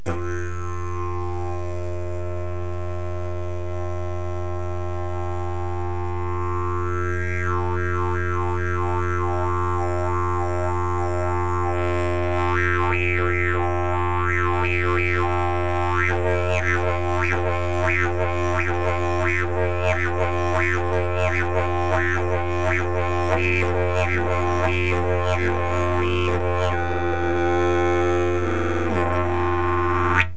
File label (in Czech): jilm, didgeridoo; jilm cestovni